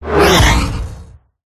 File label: whoosh; Audio Mangler